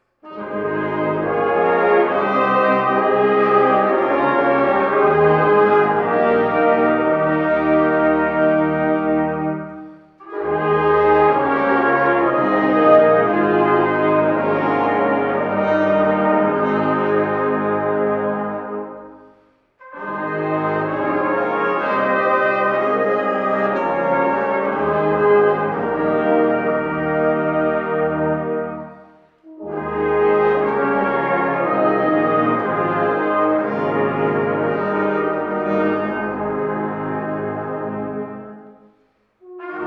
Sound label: bach chorale, brass; workshop may2009